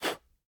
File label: candle off